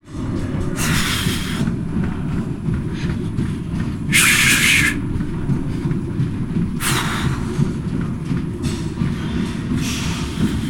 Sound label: Gym treadmill athlete exercises breathing